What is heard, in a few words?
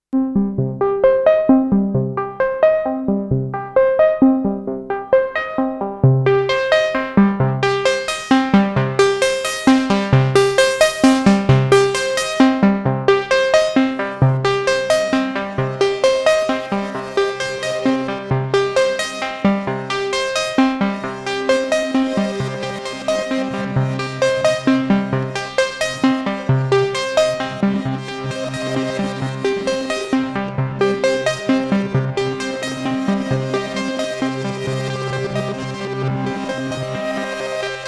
Sonicware Texture Lab EFX Mode Prophet 5 Granular Engine